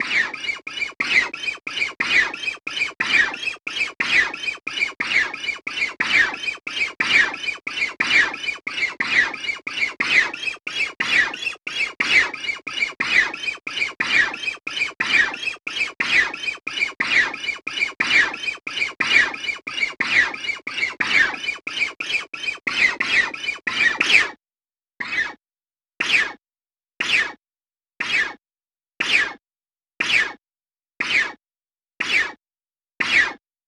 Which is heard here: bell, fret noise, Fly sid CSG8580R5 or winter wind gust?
fret noise